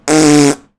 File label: fart